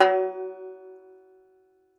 Tenor Banjo

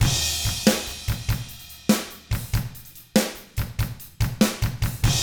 rock 6/8, drums; ILIO; Double Platinum Drums 1

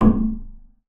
BASS FINGER; Xsample VOL-5

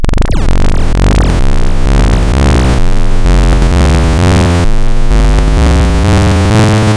Phase Shift Pow, wavetables; Architecture 32

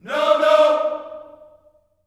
NO NO, Vocal Planet Gospel

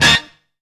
FLY HIT; Zero-G; Funky Elements

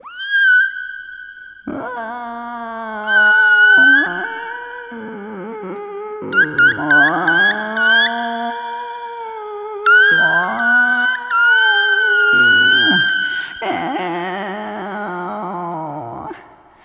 Arrythmic Atonal; Sonic Foundry (Sony Creative Software); ILONA

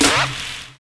RAIN BEAT; Univers Sons - Kitch 70s

